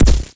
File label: mag shield